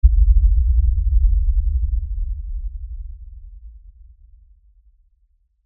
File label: LFE Tremor, Lfe Tremor Low Bass Shake Rumble; Rumbles; Blastwave